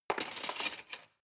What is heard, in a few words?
cutlery large drawer rattle hard